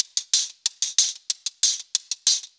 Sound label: Metal Crasher, Brushes, Perc Loops; Sonic Foundry (Sony Creative Software); Drum Tools